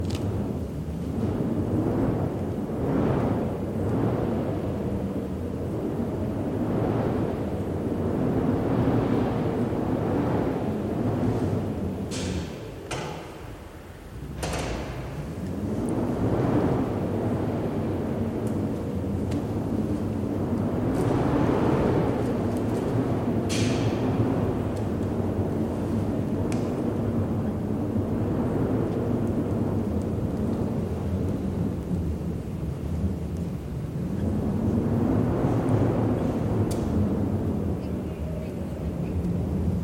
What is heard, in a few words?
Ambience Wind Subway Terminal